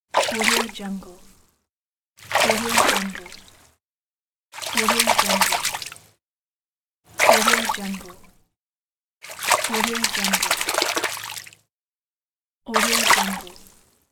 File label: fish splashing